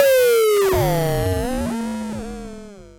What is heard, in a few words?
FX, Dual Percussion Synthesiser; Klone